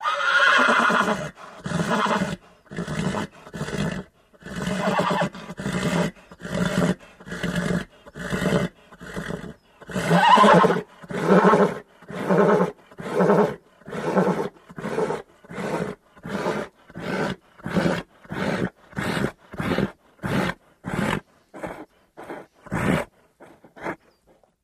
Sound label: Horse Vocal Very Exc, milling vocals